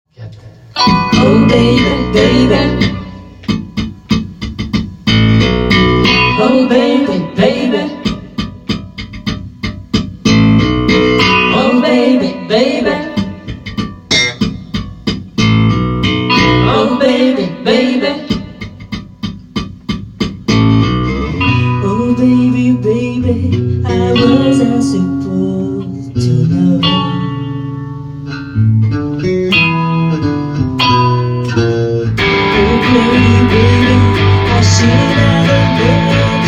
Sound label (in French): Travail Chant